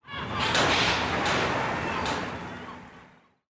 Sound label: build port; OpenFrontIO